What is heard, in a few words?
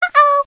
clock sparrow sms tone ringtone; clock sparrow lovely; iphone sms ringtones